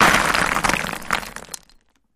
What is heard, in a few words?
Rock Cinder Block Deb, rocks & dirt